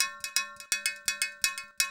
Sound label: PERCUSSN ETHNO 125, Drum